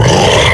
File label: attack; kfsmr flesh clown